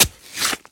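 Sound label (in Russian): беспощадный разрыв одного листка бумаги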